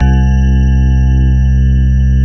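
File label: grand piano; AKAI S-Series CD-ROM Sound Library VOL-7; D.GRAND PF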